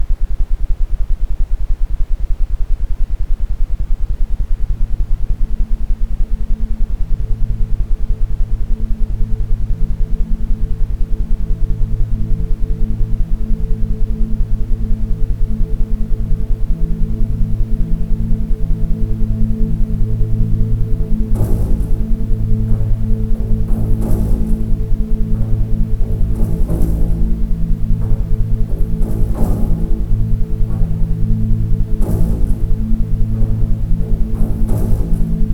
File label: isochronic, Ambient Sound; Gefuehle Sex; isochrone toene